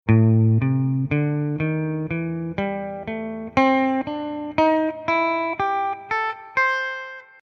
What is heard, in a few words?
blues scale a